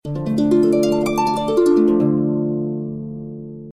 Harp Sms

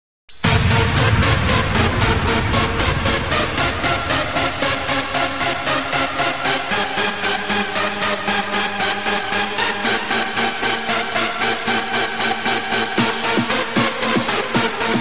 Techno

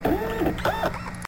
Robotic Walk

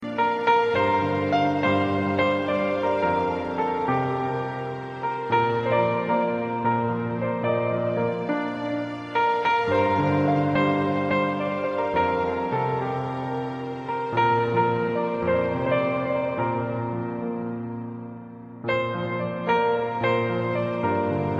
Guitar

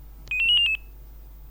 Siemens x45 Ton

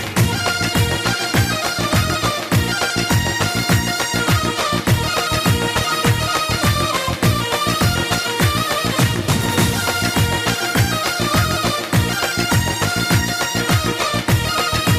Balkan Turbo Folk

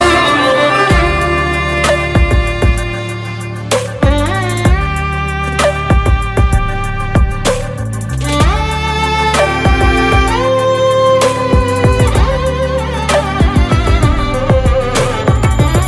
violin; antariksham

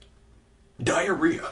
Cat; Cat Pack

